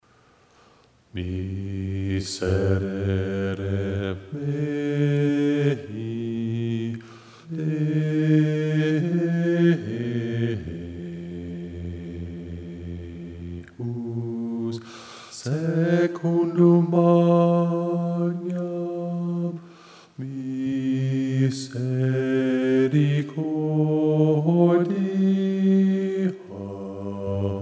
Allegri Miserere Bass; Allegri first page Bass; Lent/Holy Week 2013 Rehearsal recordings

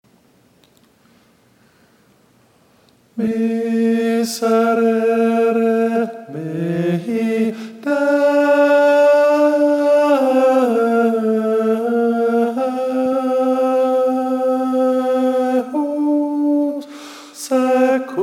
Allegri Miserere Tenor; Lent/Holy Week; Allegri first page Tenor

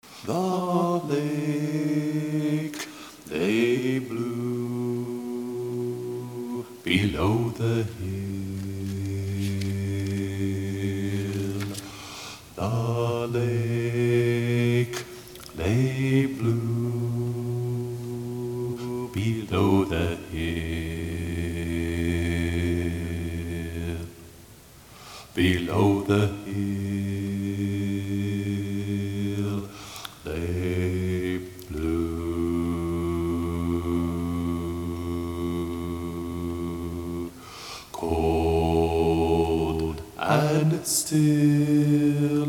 Bass; The Blue Bird; Lent/Holy Week 2013 Rehearsal recordings

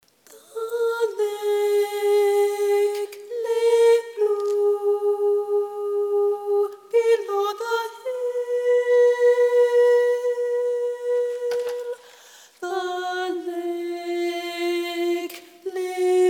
The Blue Bird Soprano; Lent/Holy Week

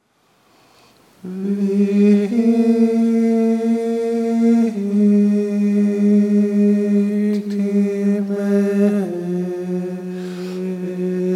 Tenor 2; Lent/Holy Week 2013 Rehearsal recordings

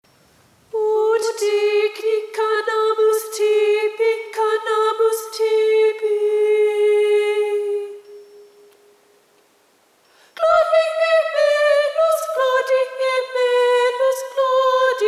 Aufer a nobis Soprano

